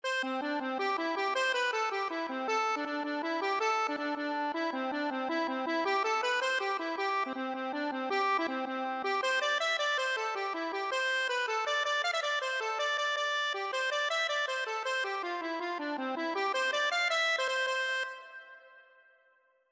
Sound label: Accordion, Irish Trad.